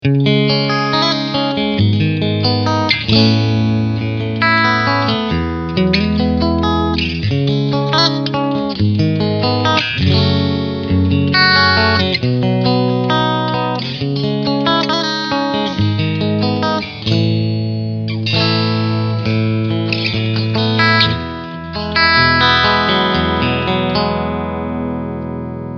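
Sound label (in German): Single Coil Mitte Steg Clean, guitar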